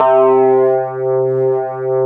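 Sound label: Wurlitzer C3, Keyboards Old School; USB Soundscan vol.09; AKAI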